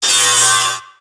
tango cut; weapons; dota; shredder

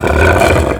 death ogre; dota; creep deaths

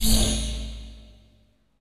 today rolloff; ui; dota

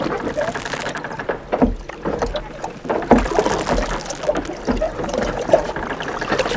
boat lap lp; ambient soundscapes; dota

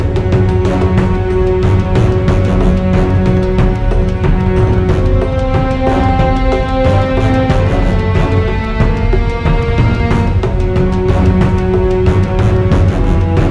muse; dota; ambient

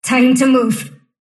sylph move pain; dota; dark willow

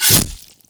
attack; dota; kez

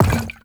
jug equip, inventory

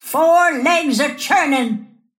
move pain; snapfire

